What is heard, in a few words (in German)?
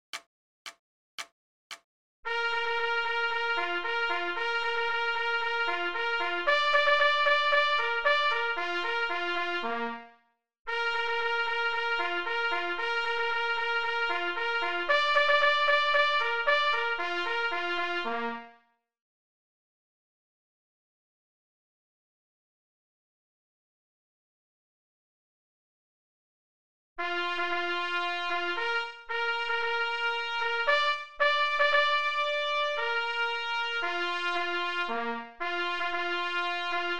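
Signalhorn, 114 bpm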